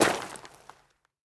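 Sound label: hit on a stack of old books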